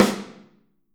Snare OH; Snare OH All; Drums Percussion

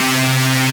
Synth, DnB One Shots

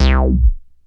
Bass Synths hits and octaves

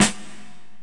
Korg x5, Drums

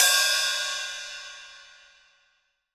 HH OP, STUDIO STEREO HI HAT

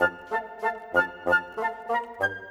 Bassoon Flute, Orchestral; Sonic Foundry (Sony Creative Software); Orchestral VOL-2; Modern 29

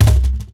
WORLD DRUMS MIXED DRUMS

